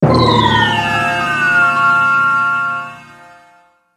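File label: Jingle Mini, failure; Ape Quest; PSP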